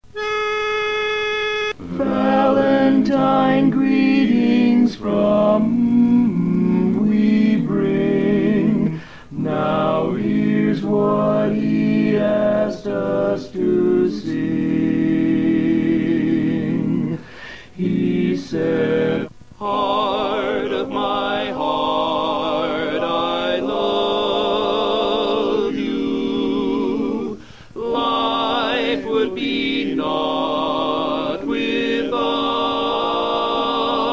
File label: LEAD Greetings, barbershop quartet